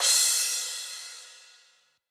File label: crash